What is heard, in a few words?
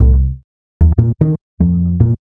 Standup Bass, Drum N Bass; Sonic Foundry (Sony Creative Software); Knutrix Drum & Bass